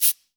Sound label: CABASA LNG, Africa; Spectrasonics; Heart of Africa 1